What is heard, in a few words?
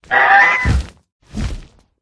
attack voice; roha